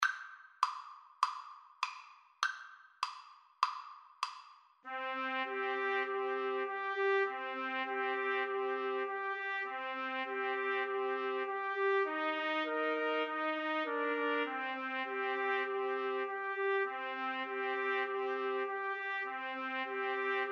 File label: mountain king, Trumpet Trio